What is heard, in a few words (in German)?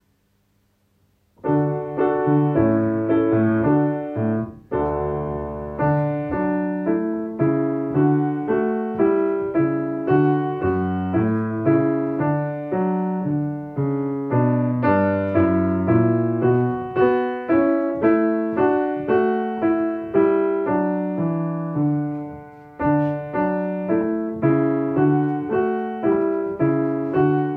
Raus, Klavier